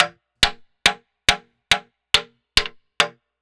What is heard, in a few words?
Didgeridoo Hits; Sonic Foundry (Sony Creative Software); Continental Drift Aftershocks